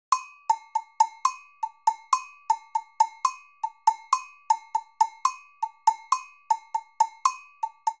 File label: Samba 2 Agogo 120bpm; Latin Percussion; Ableton; Factory Packs